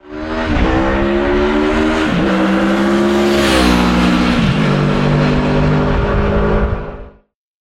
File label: passing, Vmax; 新型VMAX; 排気音; 通過音